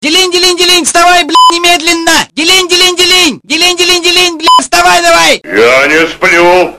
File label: Dilin dilin